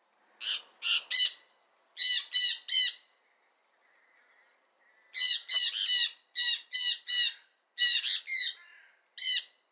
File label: Jandaya Parakeet ARATINGA JANDAYA; EXOTIC BIRDS